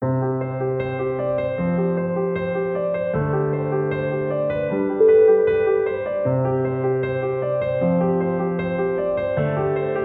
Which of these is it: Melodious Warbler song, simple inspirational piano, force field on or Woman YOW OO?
simple inspirational piano